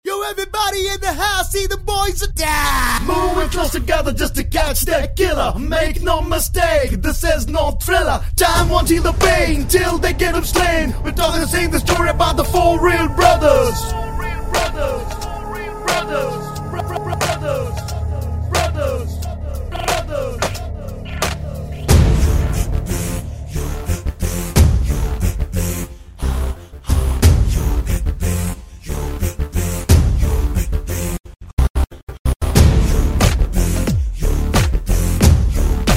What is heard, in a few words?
bgm